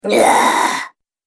Goblin Had Enough, monster; Monster Sound Effects; horror sound effects

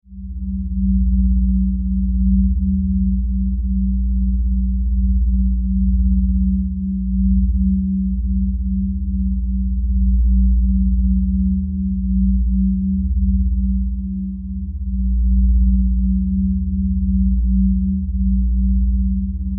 Science Fiction Scanner Drone; Tech (Sound Effects:Science Fiction)

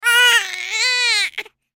Human Cry Whimper; Baby Newborn; Blastwave

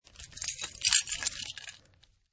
Key Jingle; Keys jingle when man picks it up, some plastic sounding noises are heard as well; Miscellaneous Household

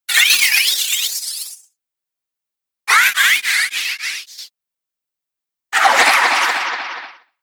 Space Zooms, whoosh; Space Zooms - Pack 5; Whoosh Sound Effects; Andrew Fleming

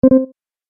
Tap Phat Button; Button Sound Effects; multimedia

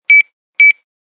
Security Door Beep, Beeping of security door; Alarms

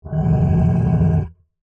Monster Groan, Horror, Monster, Groan, Creature; Monsters (Sound Effects:Horror)

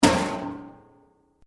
METAL BOX; Metal (Sound Effects:Materials)

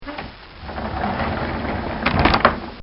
Opening Stall Door

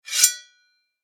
Warfare Knife Scrape Blade; Knives (Sound Effects:Combat)

